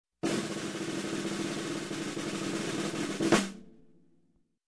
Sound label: Snare Roll Tension